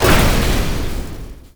step; Quake 4 Alpha; strogg; valkaryne

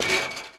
fence climb; Quake 4 Alpha; strogg tik

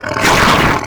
imp attack melee, monsters; DoomRTX; imp5

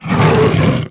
imp attack melee, monsters; DoomRTX; imp5

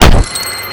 cgun shot; DoomRTX

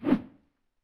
Imp Attack Whoosh, imp fireball; DoomRTX

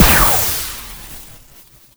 grapple fire mono; Quake 4 Alpha; weapons grapple

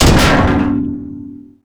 pound, strogg; Quake 4 Alpha; strogg tik

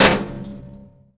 Metal barrel hard; Doom

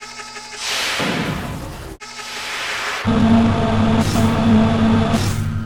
machine

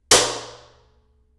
table foley; Doom

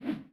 fat woosh miss; Doom

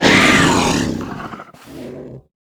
imp die, monsters; Doom

